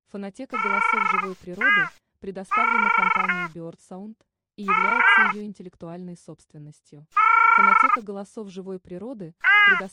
Огарь; CASARCA

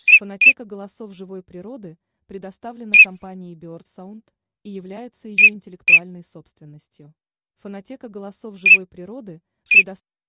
Чирок свистунок; alzavola

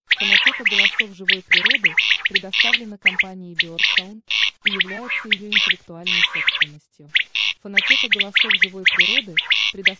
Перепел; QUAGLIA

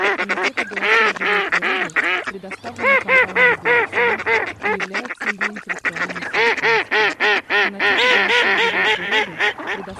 Кряква; GERMANO

